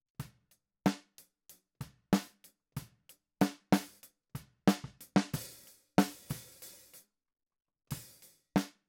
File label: Snare